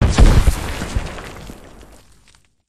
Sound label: Meteor Land Close; lethal company